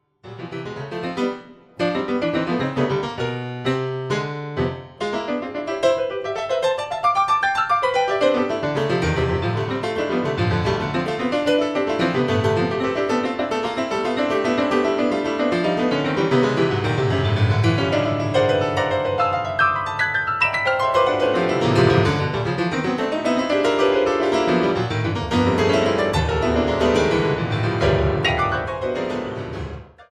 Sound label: Gigue